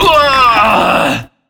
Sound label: choke Male, HUMAN